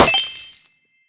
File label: Coin Pickup Small; Skylanders Cloud Patrol; Arcade, iOS, Mobile